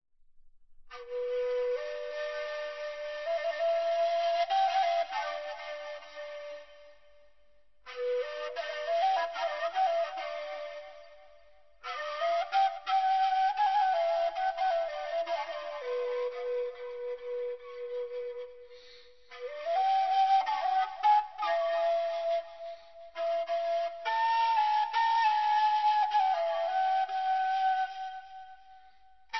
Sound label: Nay